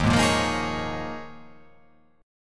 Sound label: D sharp 7th Suspended 2nd Suspended 4th